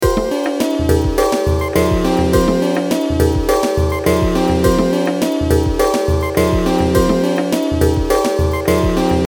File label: neutral second chords, tuning; MichaelSheiman